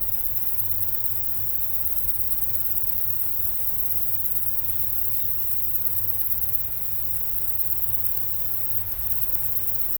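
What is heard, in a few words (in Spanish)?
sonido calmado de una playa; field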